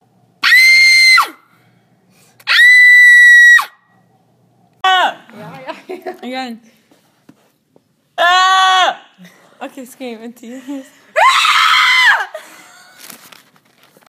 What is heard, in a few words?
Scream